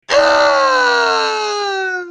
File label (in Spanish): gaviota